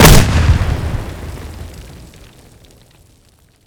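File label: grenade explosion close; Escape from Tarkov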